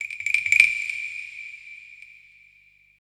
SIERRA PER

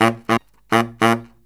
BARYT, Killer Brass Riffs

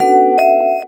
Chords Tones; Equipped Music; Loungin House

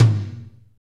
tom, fast shuffle, Roland

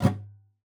GUITAR FX; Xsample VOL-2

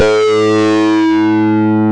SYNC, Prophet; Rubber Chicken Software Sample Bank; SCI PROPHET

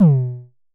Festival Kicks - B2, Kicks, Vermona Kick Lancet; LookIMadeAThing Kicks

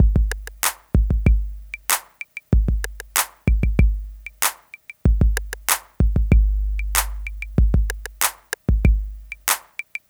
Smooth, R&B Drums

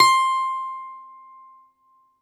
BALALAIKA, Russian Strings; E-MU Producer Series Vol. 7 – Old World Instruments

